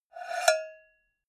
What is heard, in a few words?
cowbell scratch hit hard